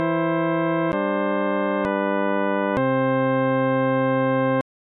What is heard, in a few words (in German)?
dominant quart vorhalt